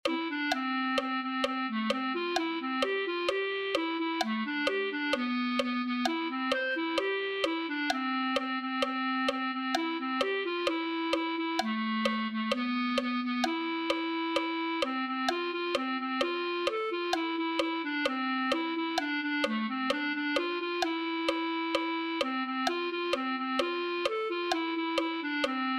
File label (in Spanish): clarinet1